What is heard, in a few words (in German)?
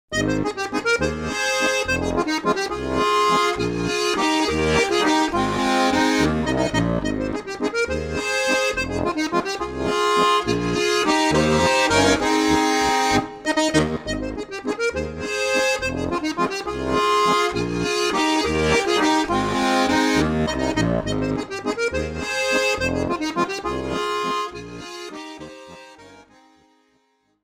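Harmonika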